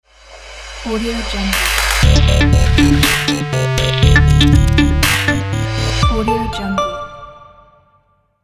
robot logo

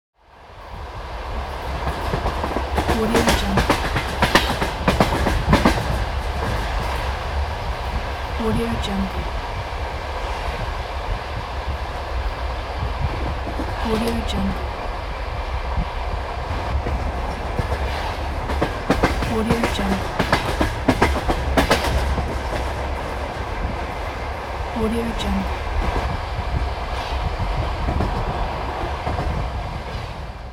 on the train

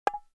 ui button glass short distorted

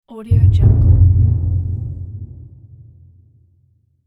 deep explosion; volkov sound

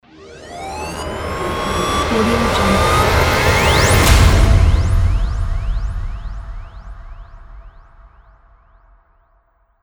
rising title effect

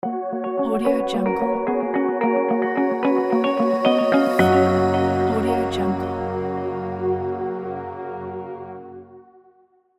logo

